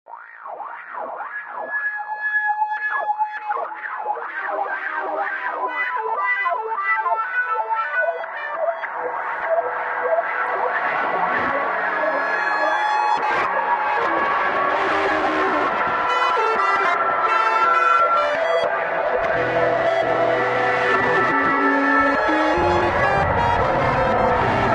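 composition for piano, guitar, bass guitar and elevator; kompozicija dlja f-no gitary bas-gitary i lifta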